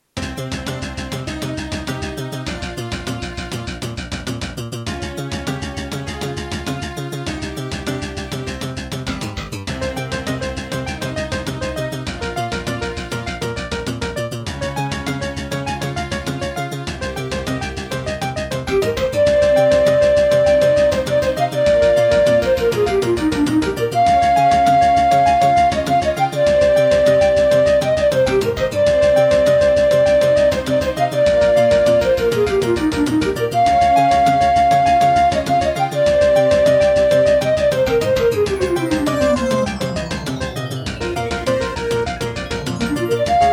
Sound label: Pine PT-2030 Vivaldi Xtra Wavetable DB; Hocus Pocus